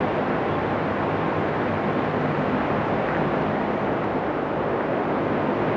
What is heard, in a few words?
wind